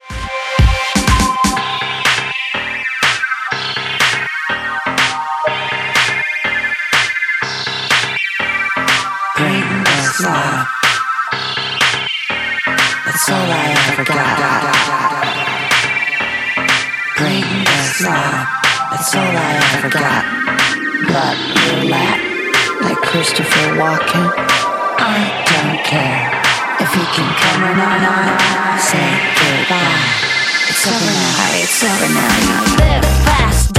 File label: remix